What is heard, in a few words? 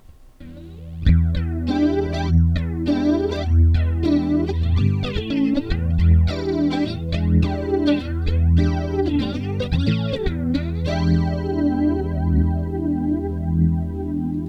flange